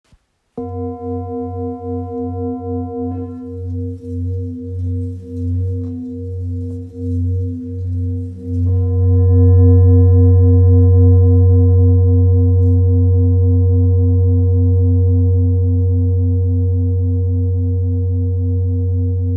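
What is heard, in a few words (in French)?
Bol ramgandi la, bol chantant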